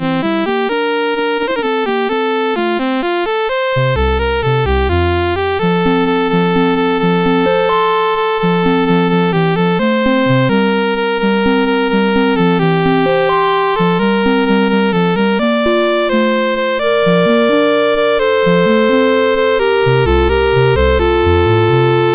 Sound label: Pastores a Beken, MOS6581